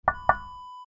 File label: monitor screen knock